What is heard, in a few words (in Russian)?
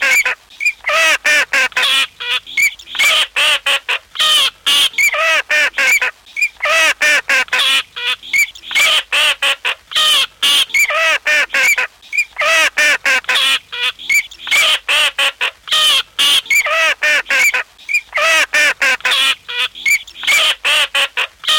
Чирок